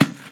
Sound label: Plastic Bag